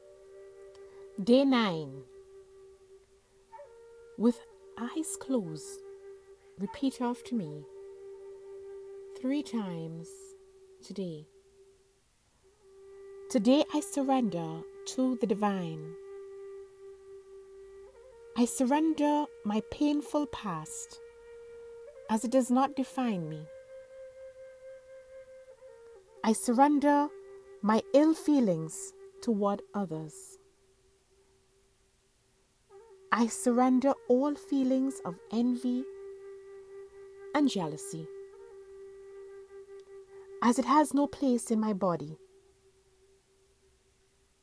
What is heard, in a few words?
healing mantra